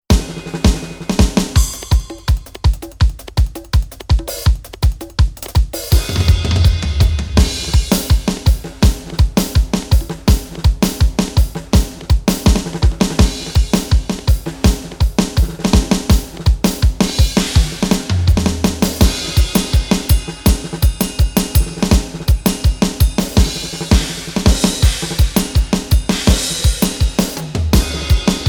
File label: frelach 2 yamaha